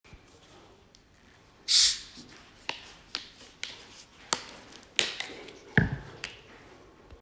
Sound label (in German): Flasche aufmachen